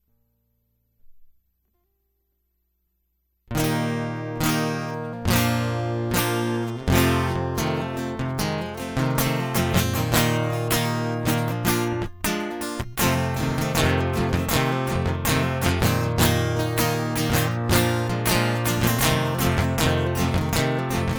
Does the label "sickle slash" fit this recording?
No